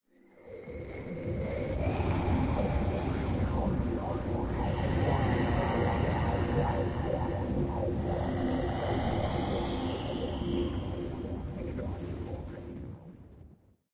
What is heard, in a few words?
elder lich idle, monster